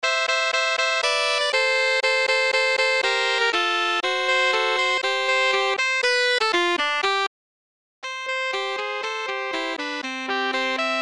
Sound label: Sax Trio